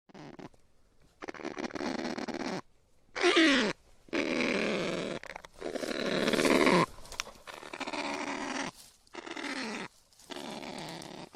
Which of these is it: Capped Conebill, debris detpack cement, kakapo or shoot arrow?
kakapo